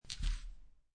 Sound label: Dice Grab Collect Two Dice From Felt; Casinos